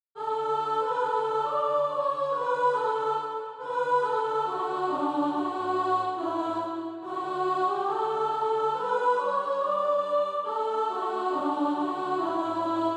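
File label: soprano, SATB Practise Music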